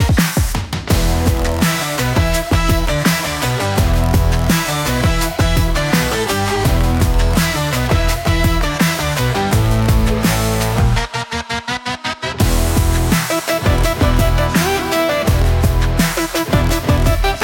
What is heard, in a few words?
83 bpm Analog Noise Funk; 38 sec; ai social media music